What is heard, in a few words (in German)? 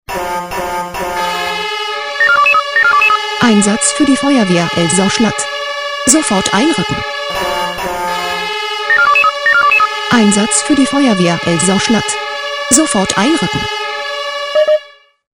SMS Alarmierungstone; FW Elsau Schlatt